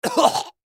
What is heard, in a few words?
Fight Voice, Combat Voice Grunt Reaction Short Cough Blood Martial Arts; Kung Fu; Warfare; Blastwave